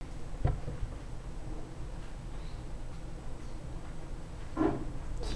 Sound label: toy